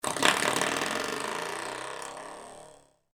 Foley Door Stopper Movement Spring; Miscellaneous Doors

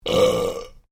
MALE BURP MEDIUM; Male Vocal sounds; Burping; Human

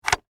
Foley, Switch, Slide, Click, Plastic, Toy; Switches

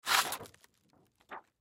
Sand Paper Pull Sheet From Package; Sanders; Blastwave

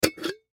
Pickle Jar Open, Pickle Jar Remove Lid; Food; Foley; Blastwave

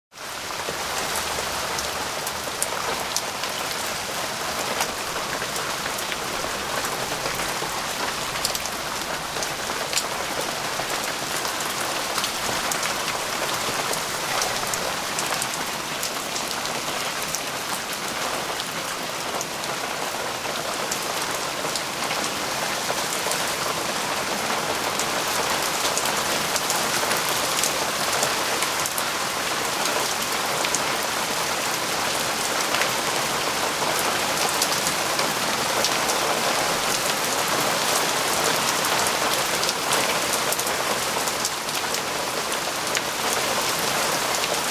Rain, Falling raindrops.; Rain (Sound Effects:Weather)

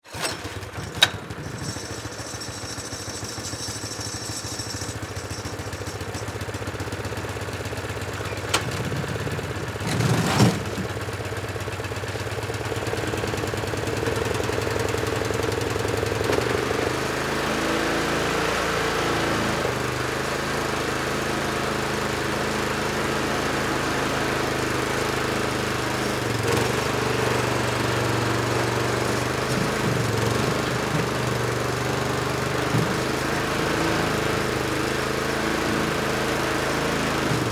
Industry Lawn Mower On Run Off Walking Mower; Lawn Mower Sound Effects; SFX Bible 6

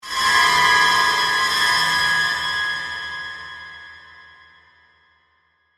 Horror Stab High, Pitch, Reverb; Scary Tone Sound Effects; BlastWave

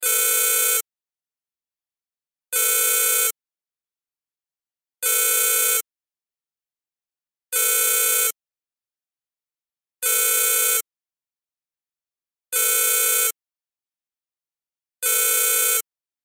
Cell Ringtone; Loop; Cell Phones; Blastwave

